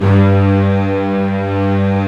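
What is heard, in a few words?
strings, Brite; String Master Series; Combos 2; Bryt Strings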